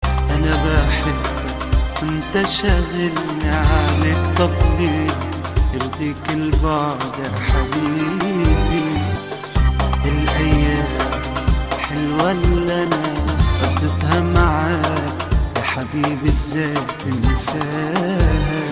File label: arabic ringtone